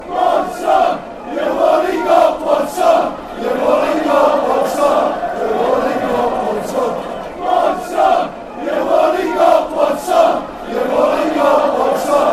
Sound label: youve only got one song, fanchants; mfc